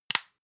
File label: floss close hard; haus-garten; badezimmer